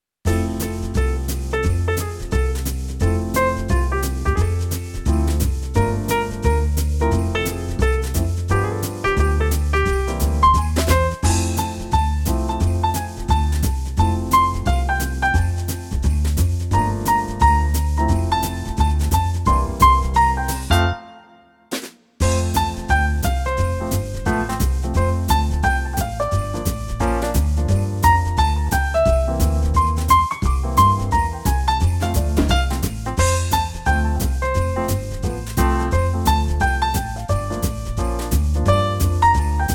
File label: christmas jazz christmas holiday